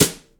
trebly snare drum E key

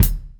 old school clean bass drum sample D sharp key; kick

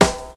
round acoustic snare g key

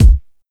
warm sounding bass drum sound c sharp key; Duration 0:01; Samplerate 44100 Hz; Channels Mono; kick

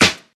modern present acoustic snare f sharp key

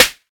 studio snare sound c sharp key

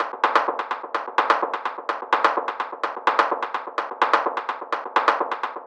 dark techno ghostly toms percussion; percussion loops

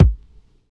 tight low end kick sound a key; kick drum samples

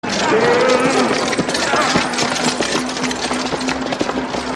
horse and carriage passing by

